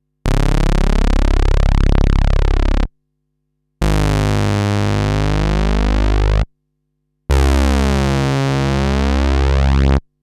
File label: MOD BASS Model 15